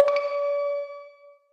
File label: pop, notifications; Sony; Xperia Z3